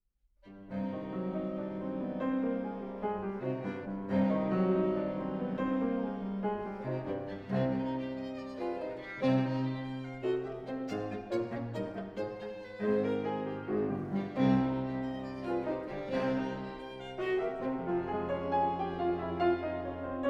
Piano Trio No 3 in G Minor; Schumann; Op 110

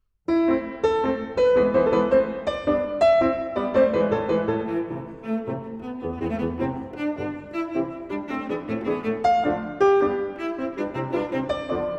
Works for Cello; Beethoven

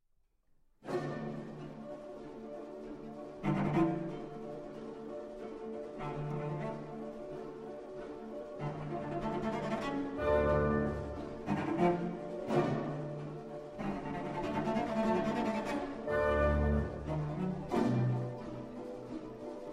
Radio Orchestra

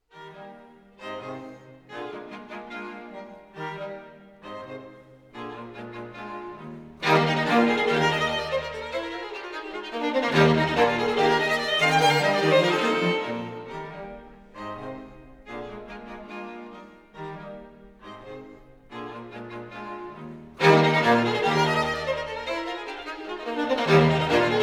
String Quartet No 16 in E Flat; Mozart

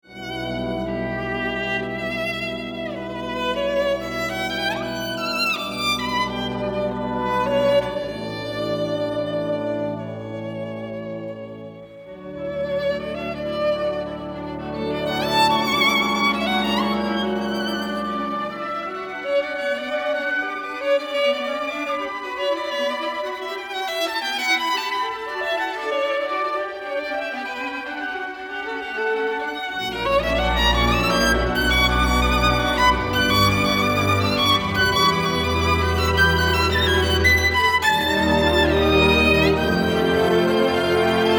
Violin Conc 1st mvt

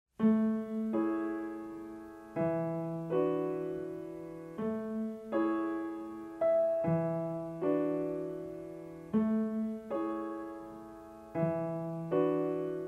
trumpet concerto; gervais

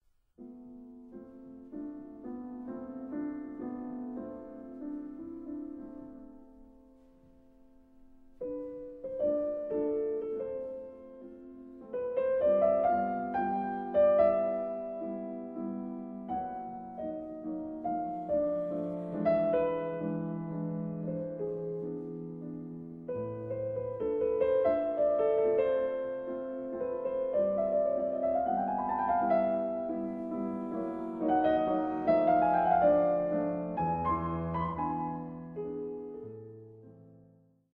chopin mazurkas

